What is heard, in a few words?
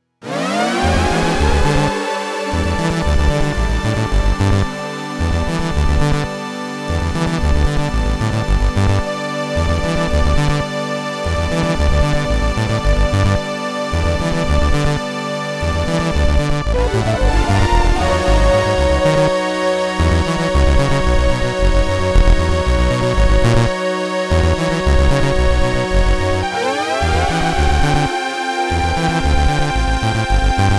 Triple Track